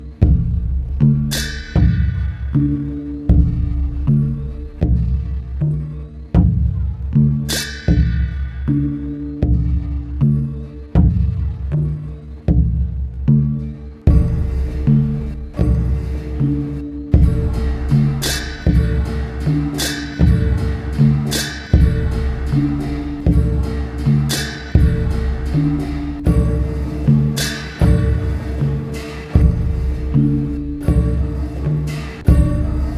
drums mixed

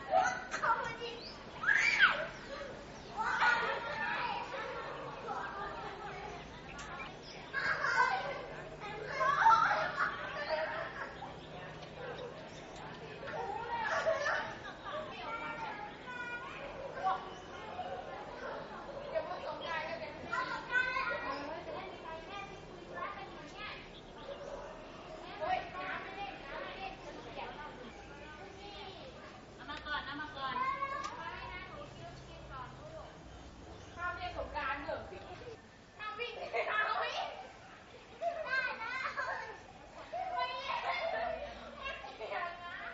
kids playing

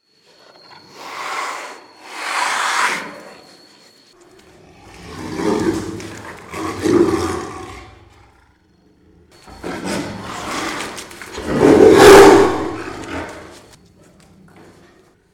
tiger

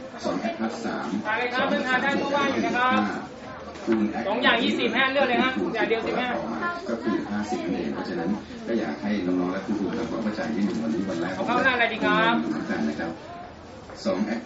market restaurant

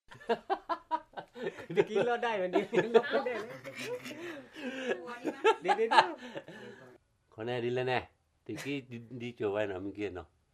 Karen khun pae song

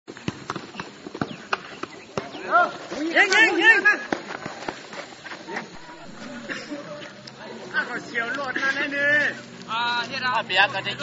football match

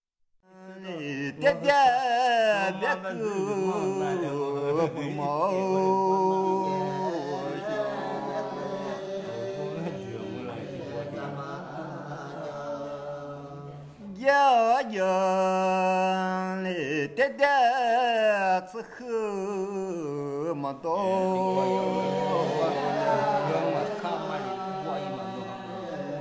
Black Lisu spirit calling ceremony

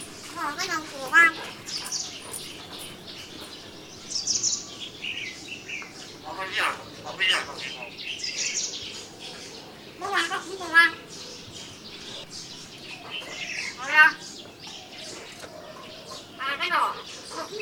talking mynah

